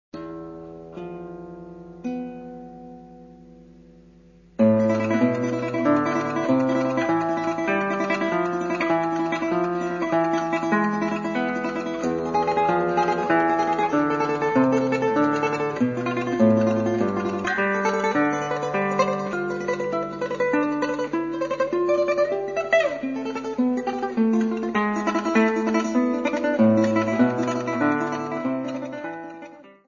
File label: etude flamenco tremolo